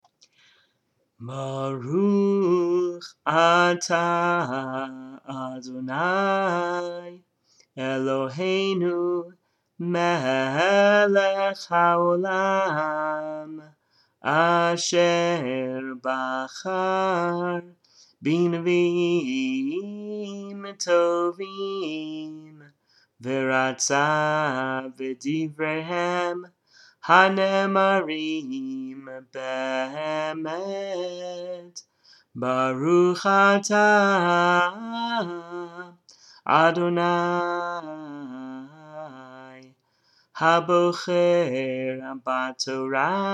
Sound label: blessing before haftarah; rabbi